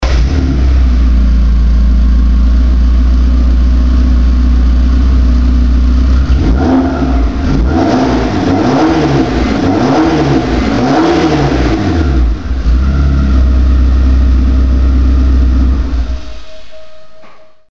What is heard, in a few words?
S2 roar